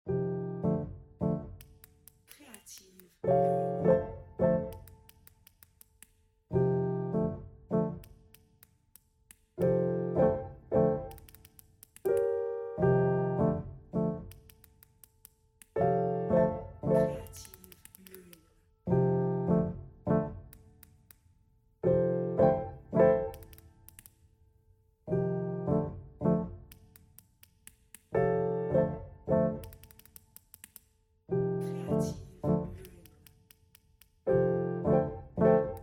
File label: piano